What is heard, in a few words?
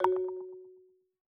Open, ringtones; momentum; CiscoSparkLauncher